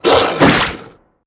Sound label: Wolfskin Attack